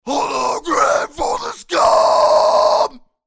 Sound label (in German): Scream normaler Pegel; Millennia HV35